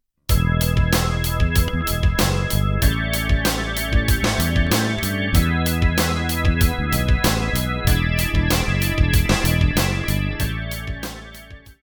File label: Real YAMAHA